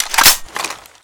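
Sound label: weapon foley steyr aug reload chamber; Call of Duty Modern Warfare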